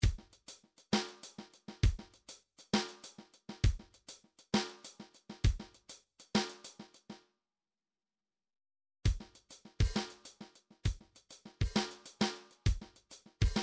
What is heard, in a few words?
midi drums